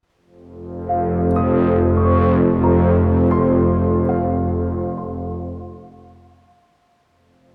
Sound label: Grab Object 95BPM; Grayle